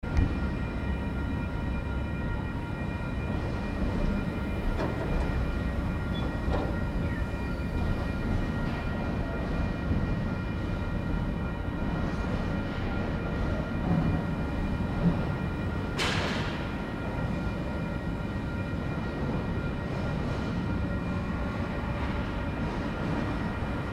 train bell; Port Chalmers

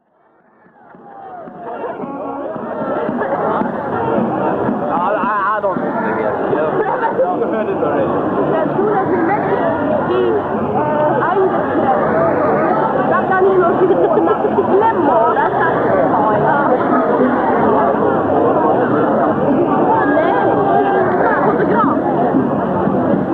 shrine dictorphone mexico, street recording

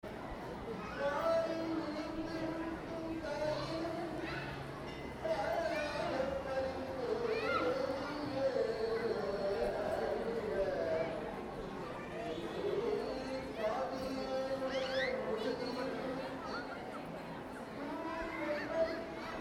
singapore singing

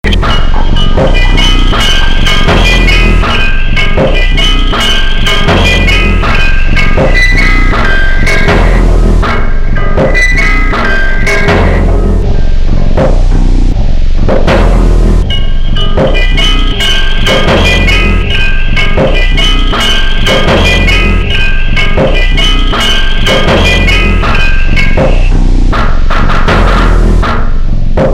UKULELE; HARPO SFX

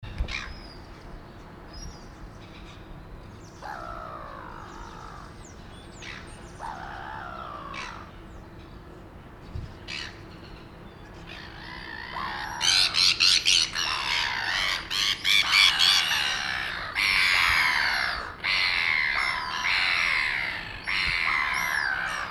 sea gull; Port Chalmers

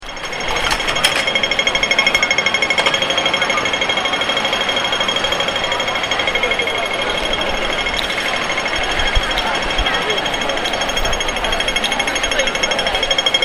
beeping street sound

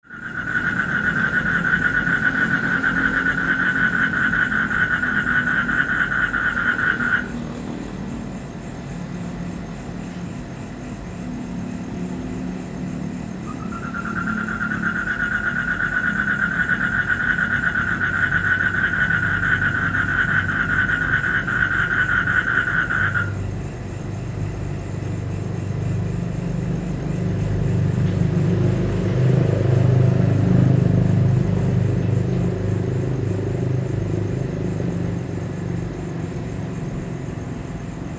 frogs

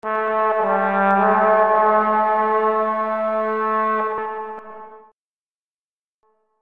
fanfare deep horn short

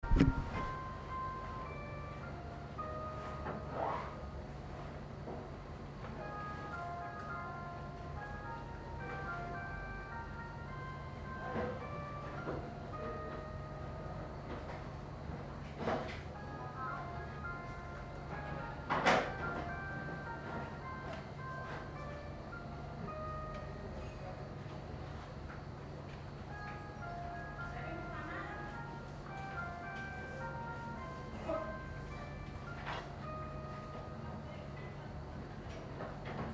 musical box

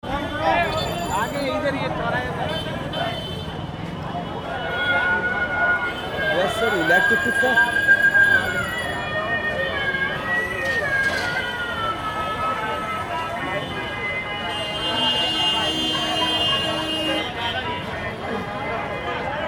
Street Music, North India

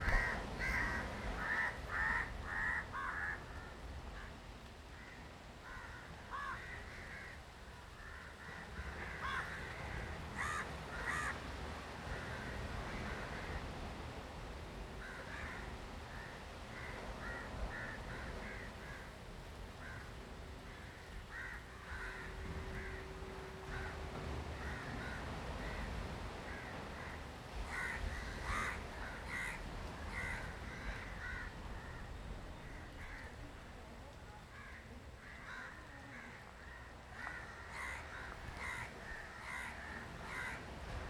crows quiet, South India street recordings

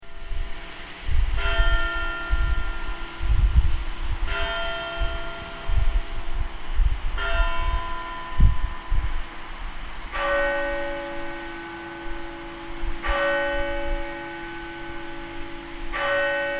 bells germany

